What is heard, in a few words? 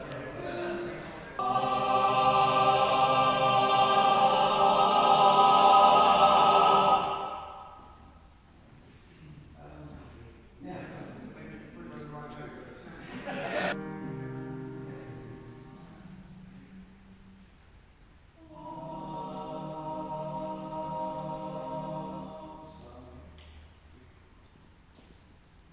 choir warm up